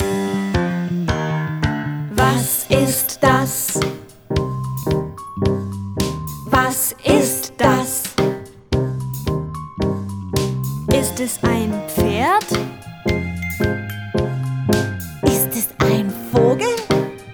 song german

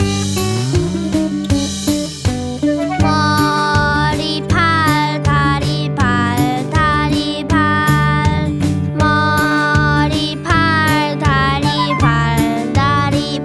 song korean

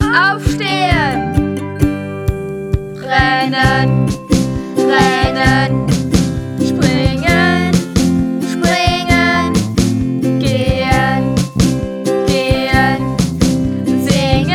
song german

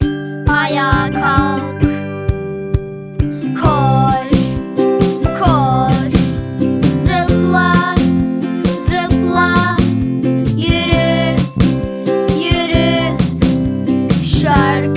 song turkish

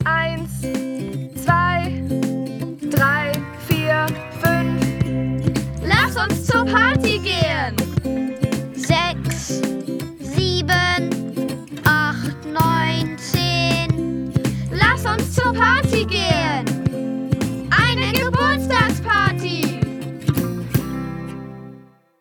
song german

